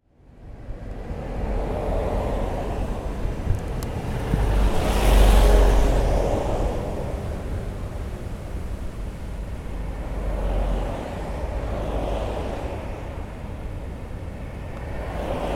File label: Road